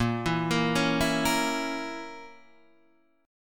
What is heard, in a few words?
Bb Diminished Bb down Guitar Open D